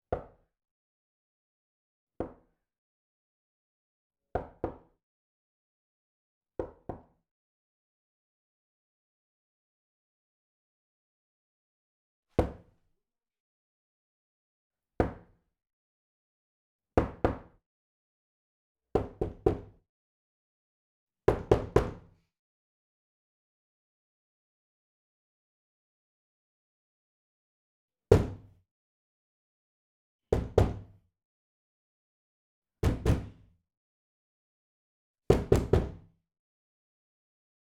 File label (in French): PORTE CHAMBRE BOIS TOC TOQUER EXTERIEUR